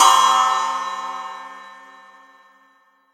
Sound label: large room cymbal crash sound c key